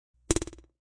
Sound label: Clickie; Clicks; Multimedia